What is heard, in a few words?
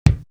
Kick; Coldcut's Kleptomania VOL1